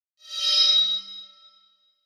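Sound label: HF Electronic Whistle Wash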